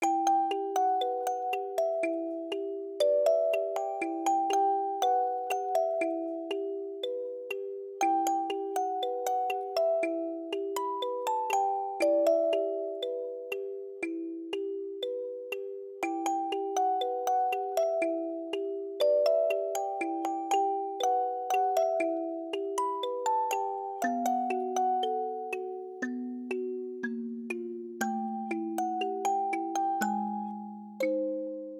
Music Box

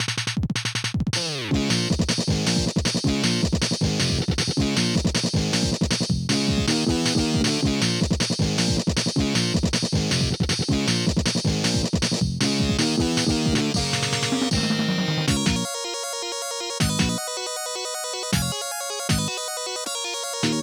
Metal (Aircheck, Retro)

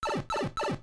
Green Pipe

